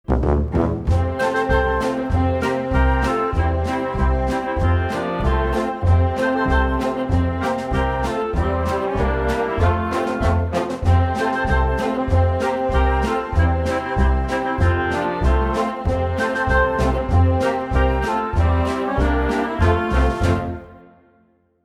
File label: INST